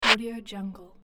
reverse shaker browse menu sound

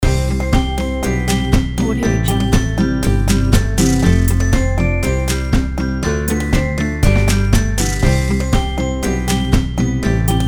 cheerful and cute

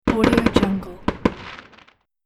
fireworks

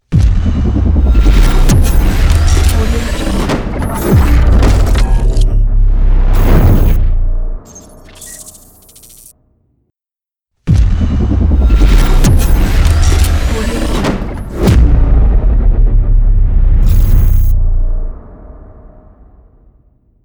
glitch geometric logo ident

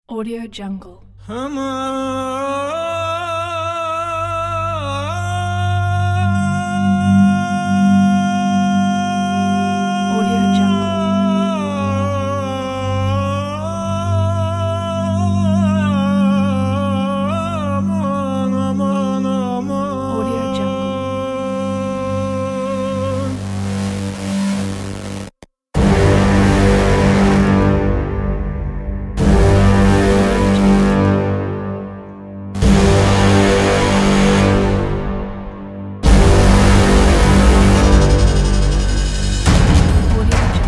epic trailer; epic trailer for your actions; cinemusicsolutions

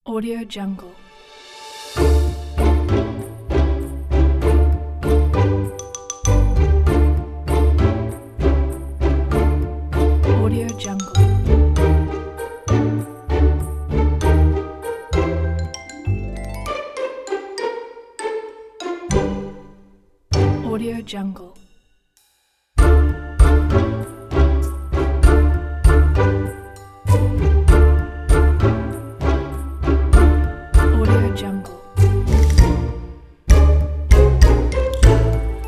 comedy story

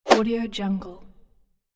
slide swoosh

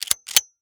gun foley shotgun; prosoundfx

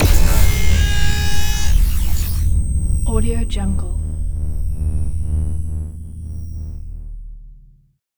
sinematic neon designed leviathan; soundmorph

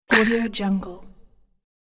snotty little monster gets killed; sonic-boom

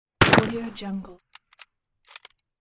rifle gun shot and reload

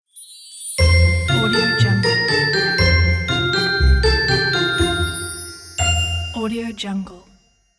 orchestral logo with bells

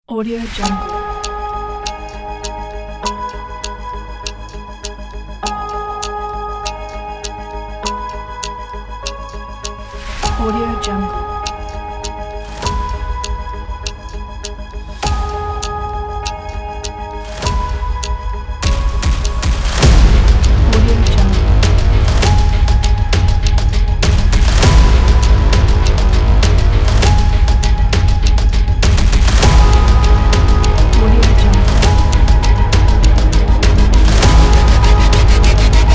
dark hybrid intro

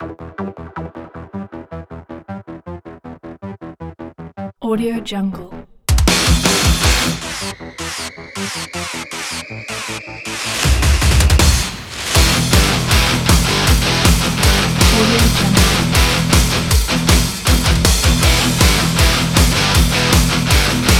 boss battle

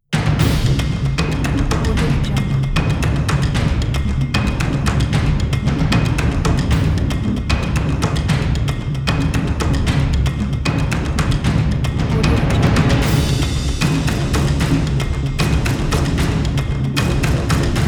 action

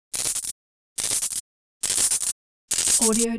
finger static zap fast high raspy electric

